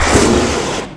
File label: gas trap; Pharaoh